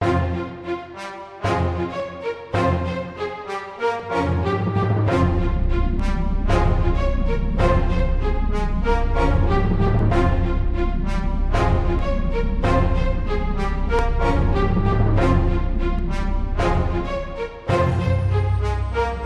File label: Battle Orchestra Loop